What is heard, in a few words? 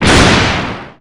thunder